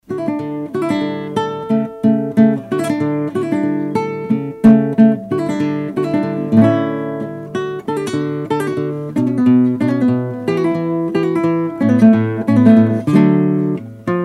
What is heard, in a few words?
Allegro Lute Concerto; Vivaldi; wedding